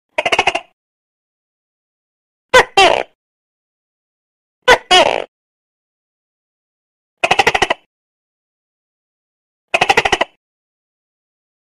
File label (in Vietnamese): Tieng tac ke keu; cho be ngu